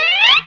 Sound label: GTR, Dirty Efx; Club-50 - Foundations Roland